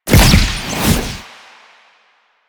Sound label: Explosive Impact; Warframe; Akarius Prime